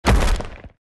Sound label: Break Block